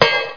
Plop; How Many Bugs in a Box

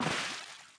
wet step; DAoC

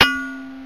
Pipe Bump; Geschicklichkeit; Hamsterball

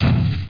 bam; THE REALM

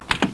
door; transpo

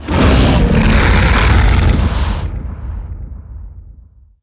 typhon hit; DAoC

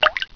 water drop; Grounch

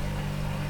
fridge Loop; Singles; French Version

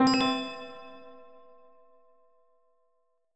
BUTTON; AV Memorial 2

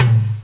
LOW TOM; Dark Forces Demo; Dark Forces Instruments

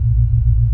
radars; lander

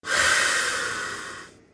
steam; Toy Story Activity Center (Disney-Pixar)

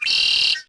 bird; DAoC; Toa

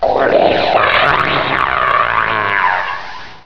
victory; Hexen II

